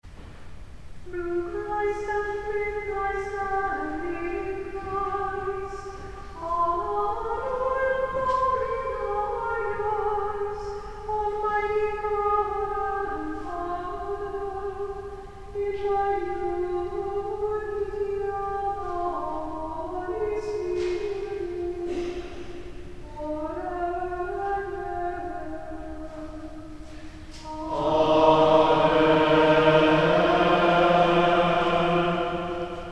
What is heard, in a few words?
Mozarabic chant; Sursum Corda (part 2)